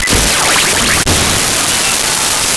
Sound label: starter; Sound Effects Library (28Cds) plus bonuses